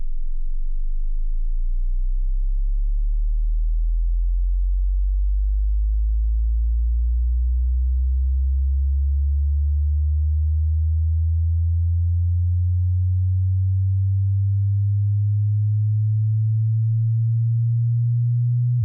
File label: sweep 20-120